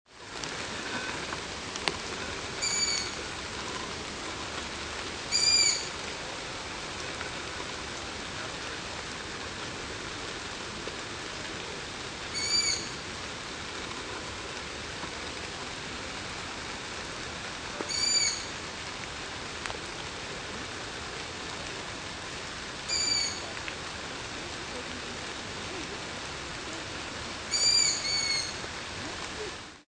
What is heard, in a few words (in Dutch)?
bedelroep ransuilen; vogelgeluiden